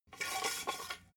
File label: metal scaffold pole slide